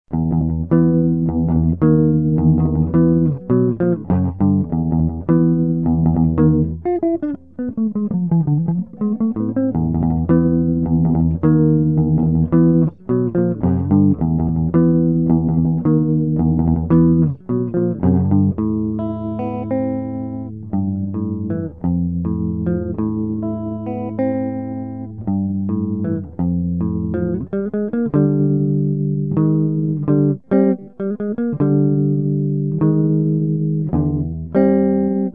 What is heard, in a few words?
Test Guitar; Модернизация "Урала"